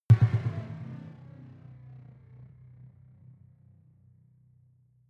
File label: sci-fi vehicle engine stall; raumschiff